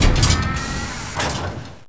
door bay Open

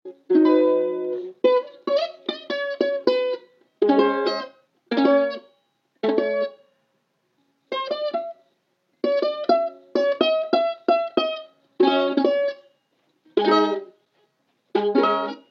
Mandolin

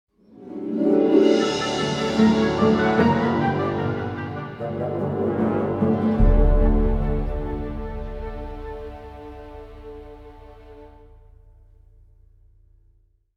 Hatchery Music; Jurassic World Evolution 2; PS4; Windows; Xbox One; Xbox Series X/S

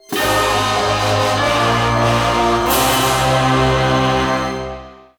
Goal Fanfare; crash bandicoot series sound box; 2nd Place

